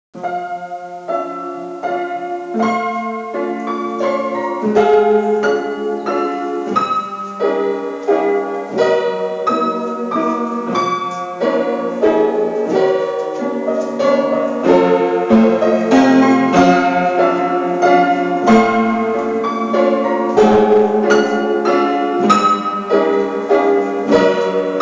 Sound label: piano tune